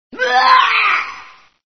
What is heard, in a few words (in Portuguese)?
sons de menino vomitando